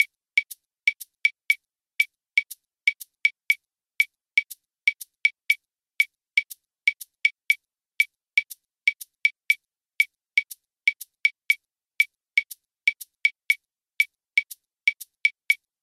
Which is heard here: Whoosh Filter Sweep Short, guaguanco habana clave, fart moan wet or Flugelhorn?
guaguanco habana clave